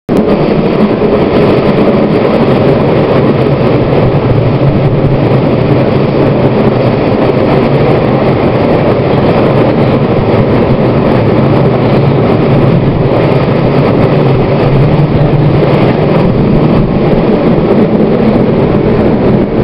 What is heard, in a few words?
Flange, Train; Taurus Siemens